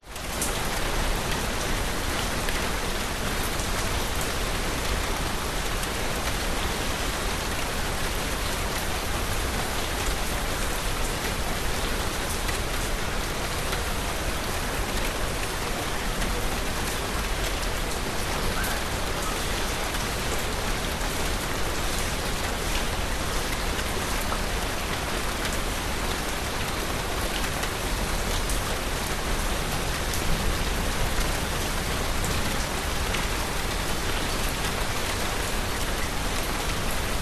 rain heavy village distant birds and traffic drips on leaves and roofs bali; indonesia; lukas tvrdon